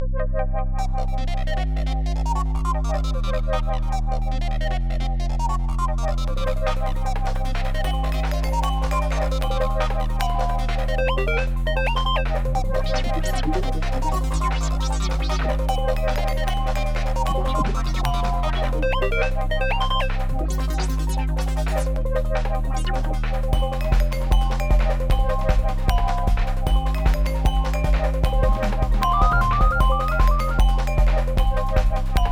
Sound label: Court Music